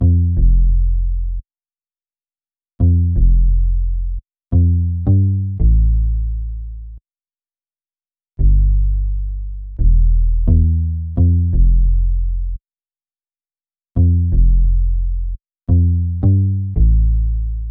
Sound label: 172 BPM A Min Bass, Loop Stems; Melodies; Cymatics; 2022 Melody Mystery Expansion